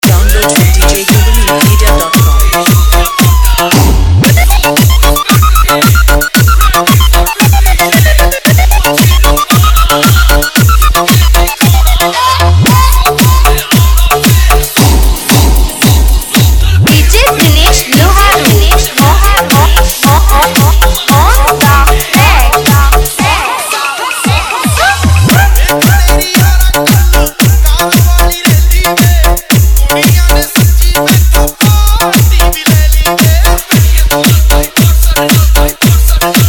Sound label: Siren Bass Remix